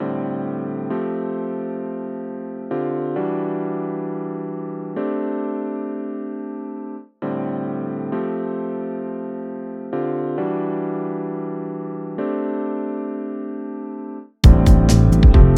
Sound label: Jazzy Rain